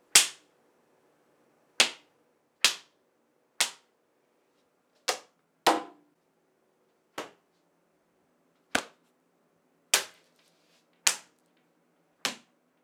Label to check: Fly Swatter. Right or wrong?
Right